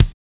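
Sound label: bass drum, Disco; DRUM SAMPLS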